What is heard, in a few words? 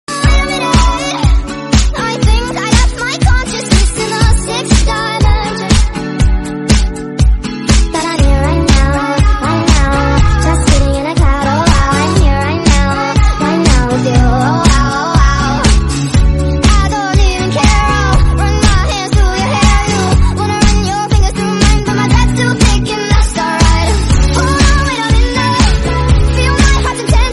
slowed, english song